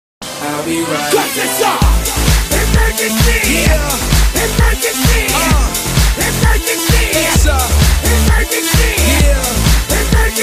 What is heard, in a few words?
win